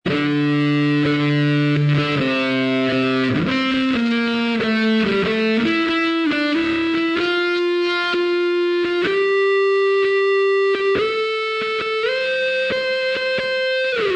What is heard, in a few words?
solo; kranch